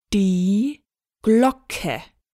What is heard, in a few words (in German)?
die Glocke; hallo oesterreich; arbeitsheft 2